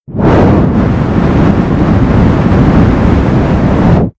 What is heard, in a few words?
Jetpack; Doodle Jump; 3DS, Android, Arcade, DS, iOS, Mobile, Xbox 360